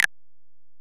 EPerc, Alesis DM-PRO

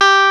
CLAVINET G4; E-MU Formula 4000 Series Vol. 3; Analog Odyssey

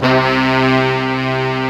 MIXBRS SEC C2, MIX.BRS; AKAI S-Series CD-ROM Sound Library VOL-2

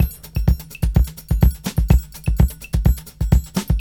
Drum Loops

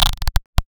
Vactrol Scratchy Ladder; 300 Drum Machines; DevSnd

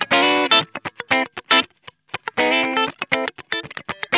SCRUNCH, Funk Guitar; Zero G - Funk Guitar

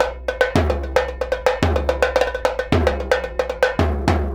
110 BONGO, Percussion Loops; BONGOS

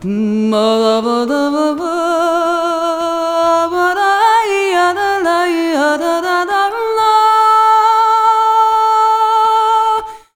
VOICES; USB Soundscan vol.63; AKAI

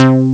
moog; Amiga Soundtracker Sample Packs

